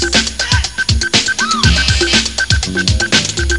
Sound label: bass break